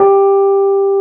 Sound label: AMB ROAD, Keyboards Old School